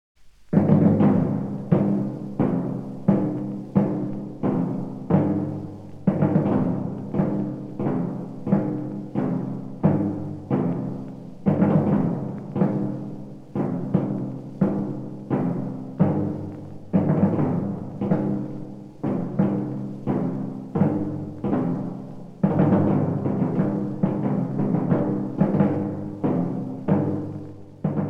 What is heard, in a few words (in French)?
Marche a deux timbales